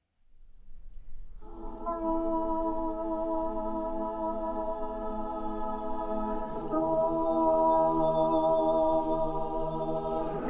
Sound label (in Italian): Contralti